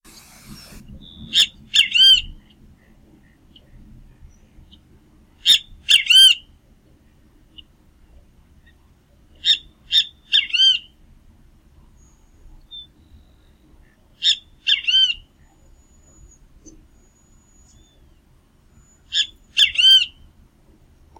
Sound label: Pitangus sulphuratus; la creacion canta